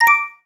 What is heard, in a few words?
receiving private message